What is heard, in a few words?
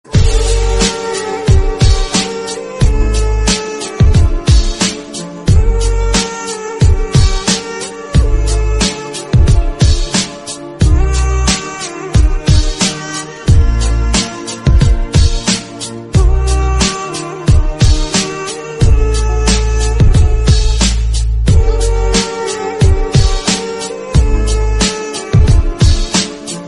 background music; taal movie